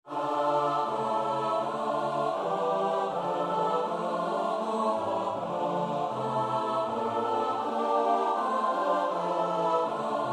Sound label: S-A-T-B; Bach (Johann Sebastiaan); 5 Leit uns mit deiner rechten Hand